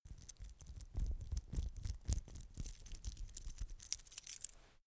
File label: metal watchstrap rattle